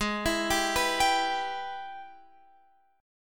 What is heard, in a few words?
G sharp Minor Major 7th Sharp 5th G sharp down Guitar Open F; arpeggiated; JGuitar